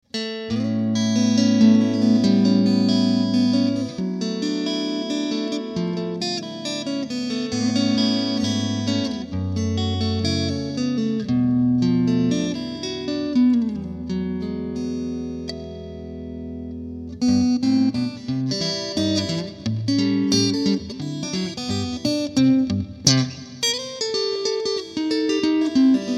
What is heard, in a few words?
Tone Lab Acoustic